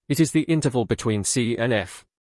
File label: perfect 4th interval